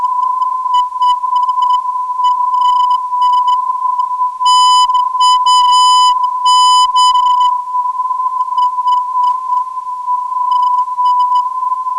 358kHz, NDB

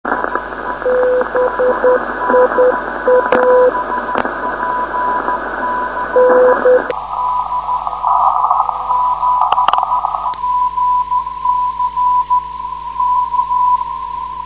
472.5khz

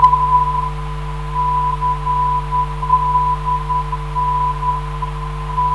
359.5kHz, ndb

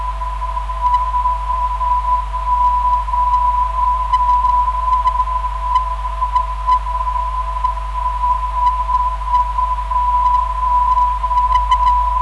NDB 515.33kHz

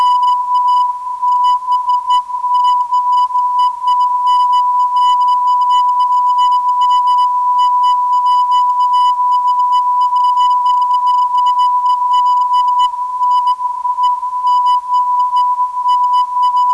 392kHz, ndb

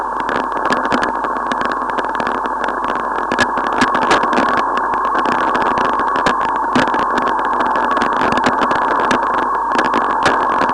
14.5kHz

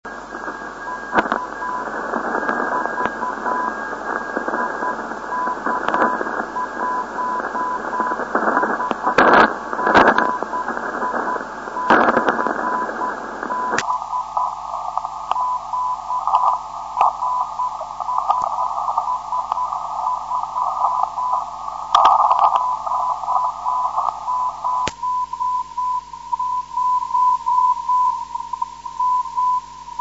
3578.6 kHz, hf beacon; ik1hgi

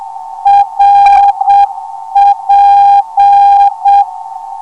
475kHz, ndb

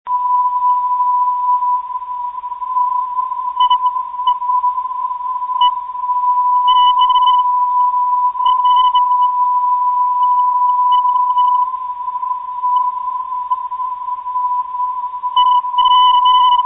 398.08kHz, ndb

